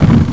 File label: bump; Superbike 2000